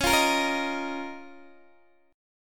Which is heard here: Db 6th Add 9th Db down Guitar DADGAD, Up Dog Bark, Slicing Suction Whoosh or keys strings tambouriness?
Db 6th Add 9th Db down Guitar DADGAD